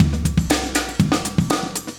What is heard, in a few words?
chique 120, loops, 120bpm; Sound Effect Library